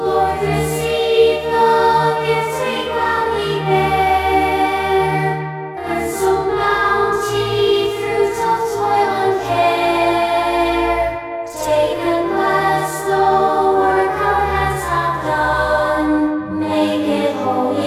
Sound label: choir